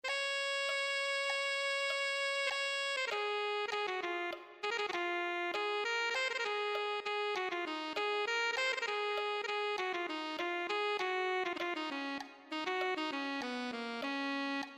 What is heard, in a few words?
alto saxophone